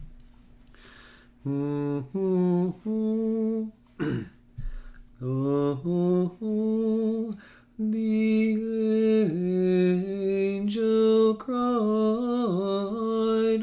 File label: soprano; Angel Cried